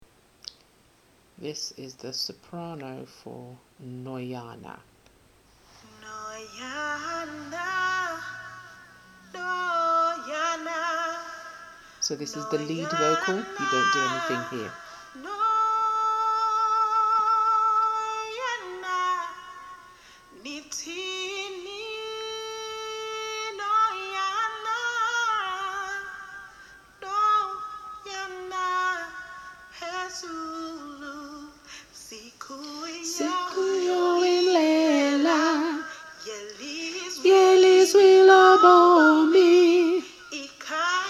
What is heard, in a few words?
Noyana Sops, gospel choir